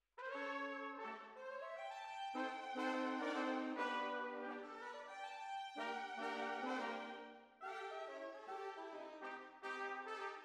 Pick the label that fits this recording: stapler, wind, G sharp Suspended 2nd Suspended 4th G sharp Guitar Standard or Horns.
Horns